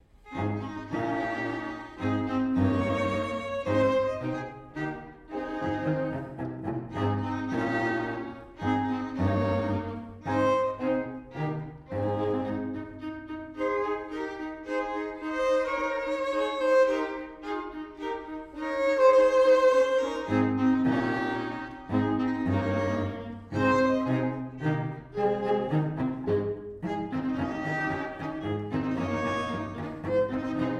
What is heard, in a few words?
string quartet; wranitzky